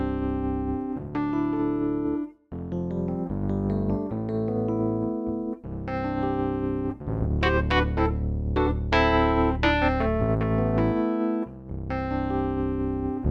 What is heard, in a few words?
KEYS; MyMix Recordings NAMM 2018